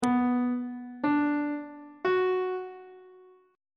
c flat minor chord 1 is c flat minor on treble clef